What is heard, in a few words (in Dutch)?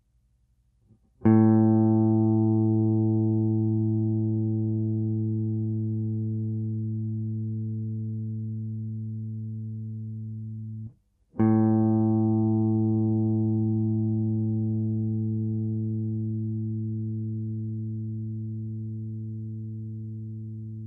GIT 440, gitaar; meting gitaar